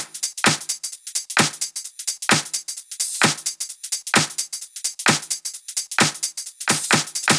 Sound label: 130BPM Kit; Vengeance Samples; VFH1 130BPM Change Kit